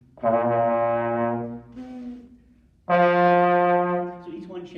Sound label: Trombone Notes